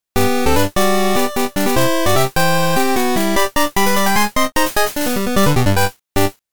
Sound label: RADIO JINGLE; Pokemon Heart Gold and Soul Silver